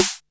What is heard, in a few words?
Snare, retro house samples, Drum Hits